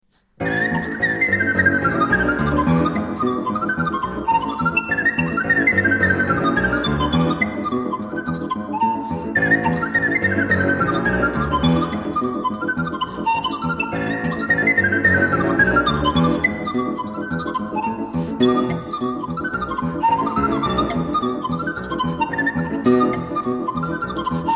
Romanian Suite Sirba Olteneasca, Pan Flute and piano; Arr. for Pan Flute and piano by Fabian Müller